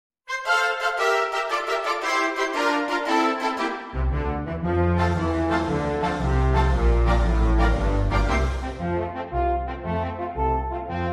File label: Alphorn Antics - Harmonie